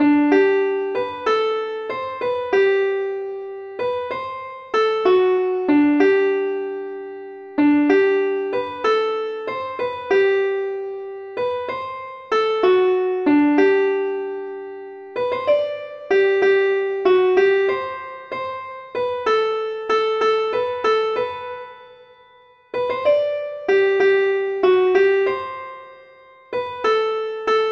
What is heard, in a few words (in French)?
valse